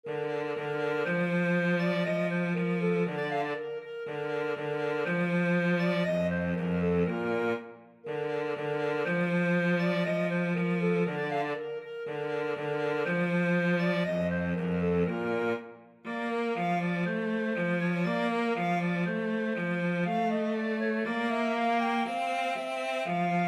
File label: clarinet cello duet; Mozart12 8